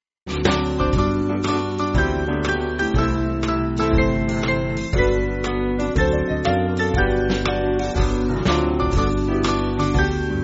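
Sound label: Band, Music Backing Files for Churches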